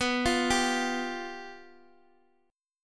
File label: F Suspended 2nd Flat 5th, chord